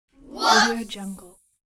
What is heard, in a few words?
children group whoops